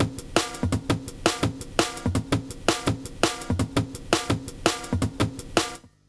LOOP, Explosive Jungle

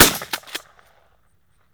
SHOT; Deadly3D; E-MU Producer Series Vol. 5 – 3-D Audio Collection; 3D Percussives

